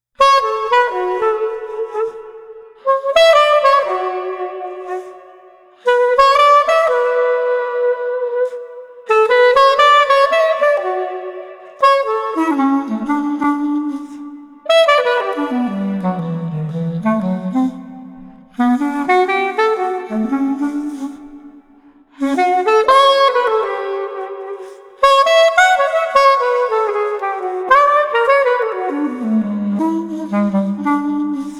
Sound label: Street Clarinet Lonely